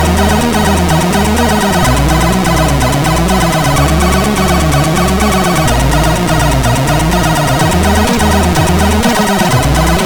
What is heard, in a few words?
Crack Intro, MOS6581R4; Zzap